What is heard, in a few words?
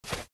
Sand Step